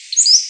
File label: song, Empidonax difficilis; Passeriformes; Tyrannidae